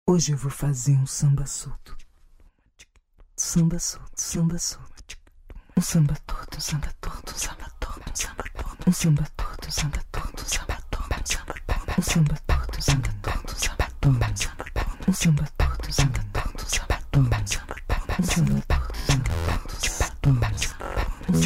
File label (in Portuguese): Samba